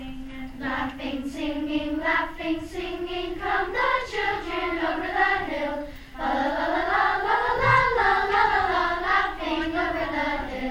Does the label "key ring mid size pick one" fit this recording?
No